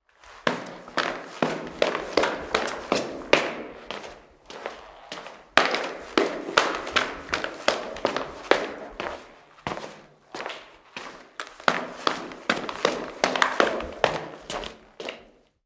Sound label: Footsteps on Stairs when Going Downstairs